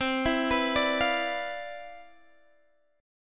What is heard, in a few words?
C Major 11th, Guitar Open D, chord